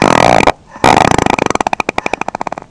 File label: fart